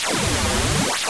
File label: Phaser